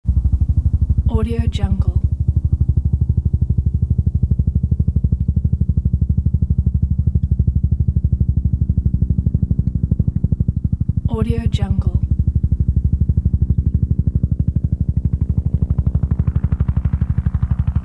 helicopter approaches overhead and passes

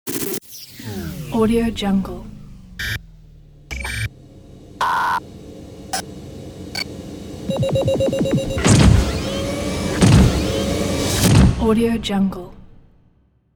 radio jingle ident

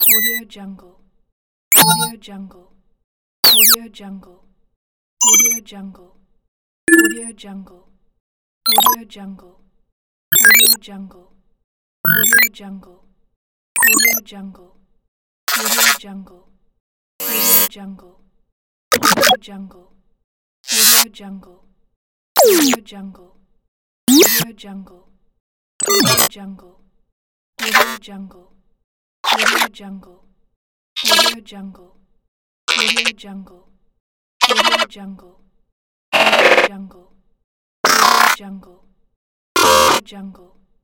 interface fx

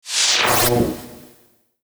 sharp laser; sound ideas

